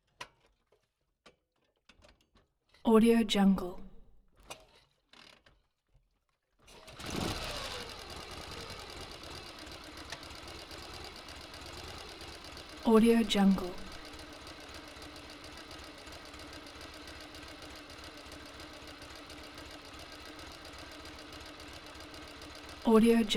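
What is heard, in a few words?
effects exterior pan start idle kill; collanderson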